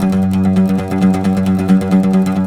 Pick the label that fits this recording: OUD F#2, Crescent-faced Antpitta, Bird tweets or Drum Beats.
OUD F#2